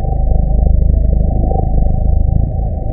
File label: Lithomorph Loop; Special Creatures; DynamicEuropa